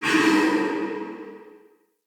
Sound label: Ghost Soldier Pain, Vocals